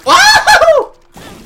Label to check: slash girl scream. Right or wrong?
Right